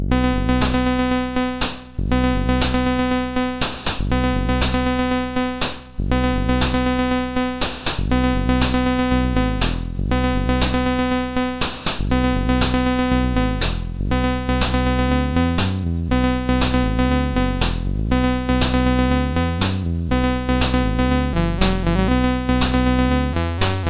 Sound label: Voices, MOS6581R2; sid; Master Composer